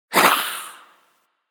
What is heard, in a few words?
Damage Voice; children of morta; Scorpion King